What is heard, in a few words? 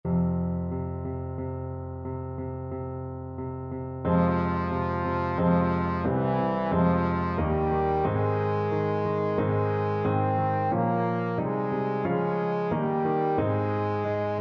pavane, trombone